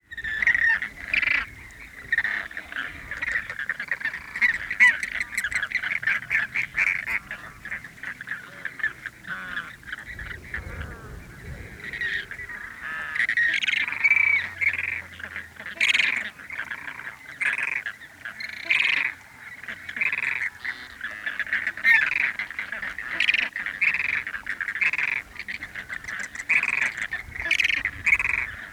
Flamingo birds in large perspective light wind gust, Chile